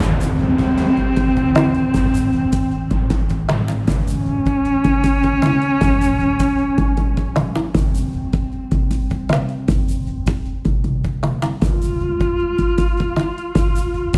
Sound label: Duduk drum; Necropolis